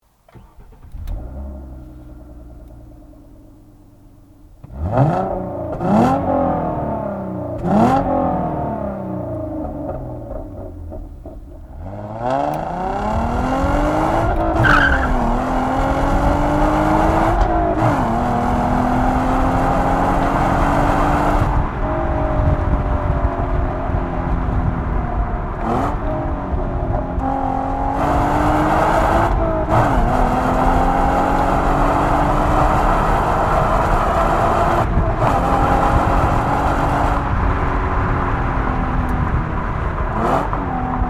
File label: Hyundai Genesis 3.8 R Spec